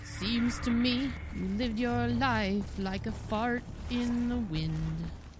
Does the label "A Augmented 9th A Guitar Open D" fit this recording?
No